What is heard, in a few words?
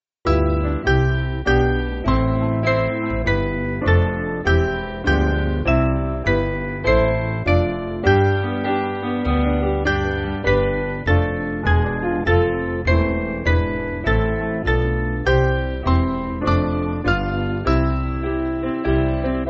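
Piano, Music Backing Files for Churches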